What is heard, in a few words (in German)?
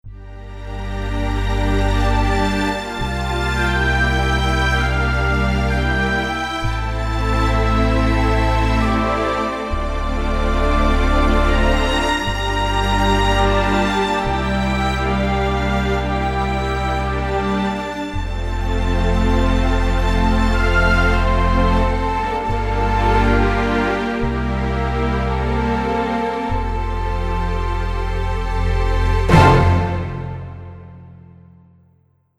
Streich Orchester; Wersi